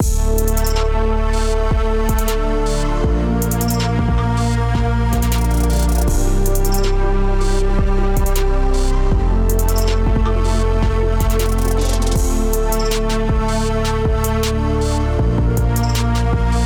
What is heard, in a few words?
Melodies